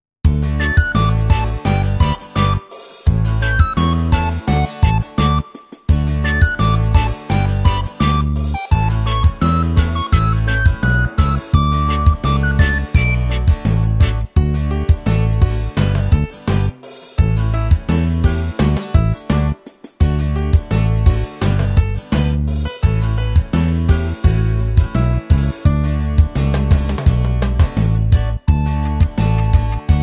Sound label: Karaoke